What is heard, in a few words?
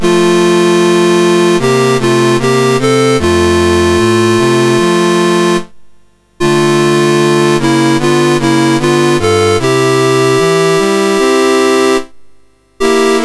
National Anthems, MOS6581R4; Thailand; Dave Marquis